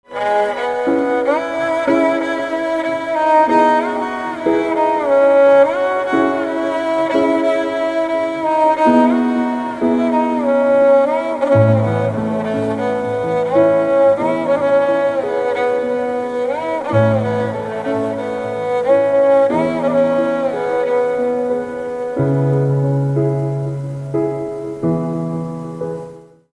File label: Violin, instrumental ringtones